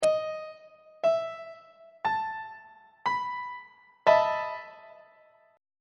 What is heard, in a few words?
e major 7th suspended 4th 3rd inversion on treble clef